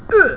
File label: ouch; xbomber